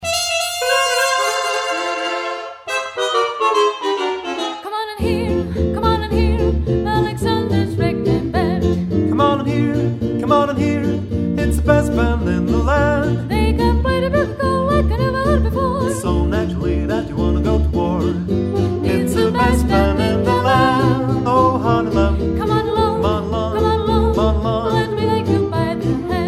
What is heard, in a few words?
Ragtime